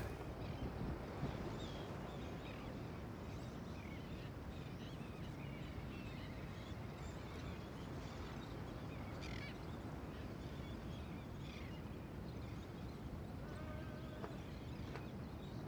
Amb Outdoors birds; Lucius III

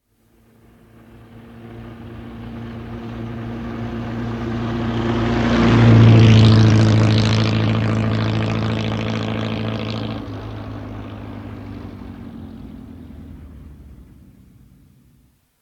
Car Speed Past

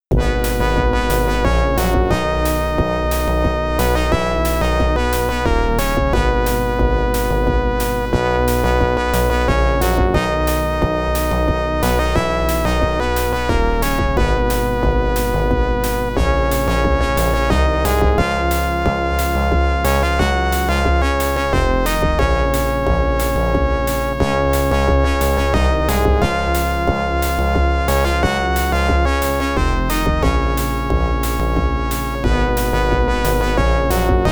Victory (Adlib); Days of Thunder; MS-DOS

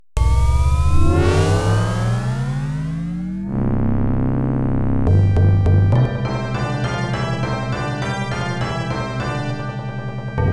Ending Music

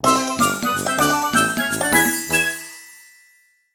Puzzle Clear; toro and friends onsen town; toro to puzzle dokodemo issho; android

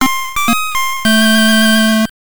Link Error; GB; Extra Bases

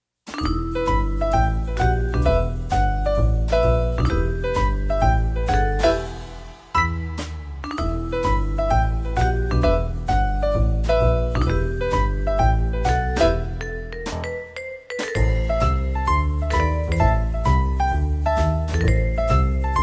Jazz; Cosmic Osmo and the Worlds Beyond the Mackerel

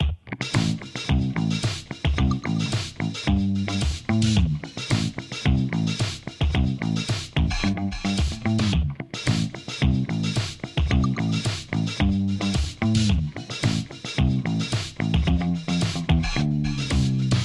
Dance Floor (Waiting); Open Fortress; macOS; Windows

